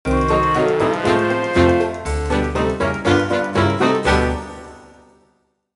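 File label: Sting Level Cooking Complete, game music; princess and the frog; nds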